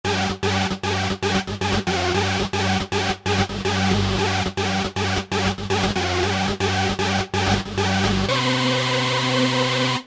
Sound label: Dirty Lead; Roland SR-JV80-11 Techno Collection